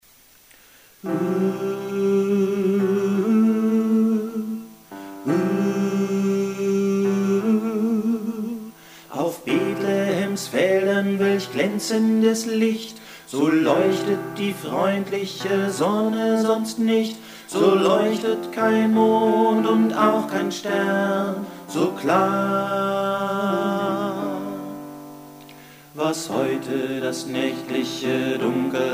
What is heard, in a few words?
hallelujah bass